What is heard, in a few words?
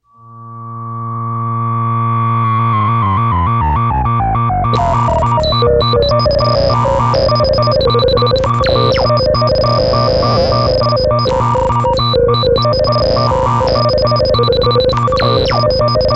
Roland Promars Compuphonic Riffs, both channels